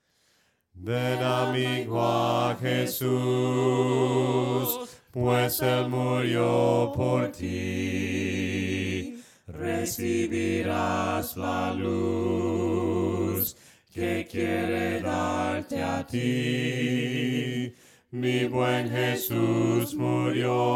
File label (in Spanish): VOCES, himnos a capella; cantos del camino; VEN AMIGO A JESUS